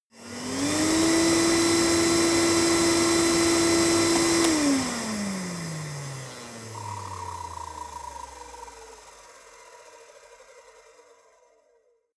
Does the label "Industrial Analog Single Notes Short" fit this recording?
No